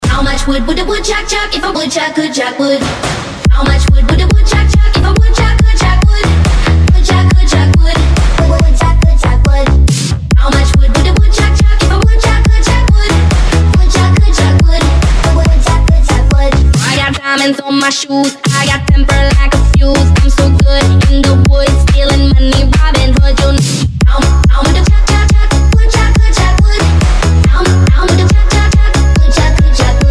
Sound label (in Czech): hudba DISCO